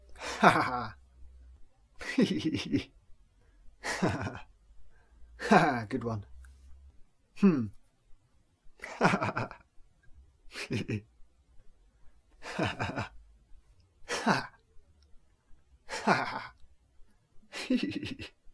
Voice Request Laughing Normal

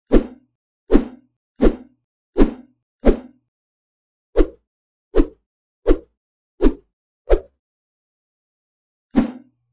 Sword Whoosh Sound

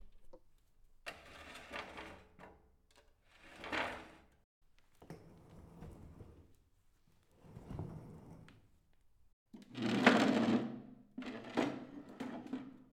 Moving A Table Couch And Chair

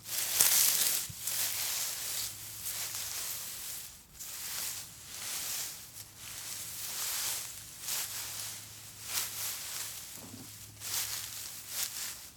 Hay Outdoor

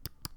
Mouse Click

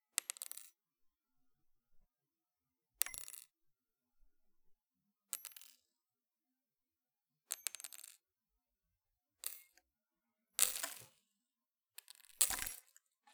Pin Then More Pins Dropping

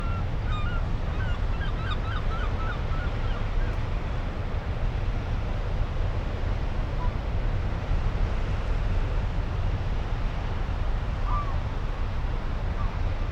Coast Seagull Ship

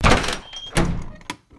Metal Door Impacted Swings Open

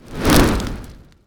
Whoosh

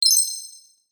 Ui Confirmation Alert

